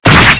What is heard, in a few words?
slash; 斬撃